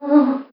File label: Uh; tempest 4000